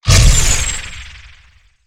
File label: Teleport; Evolve; Wraith